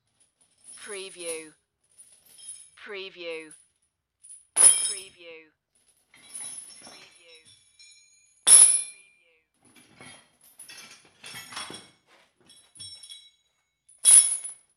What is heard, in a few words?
Chains Moving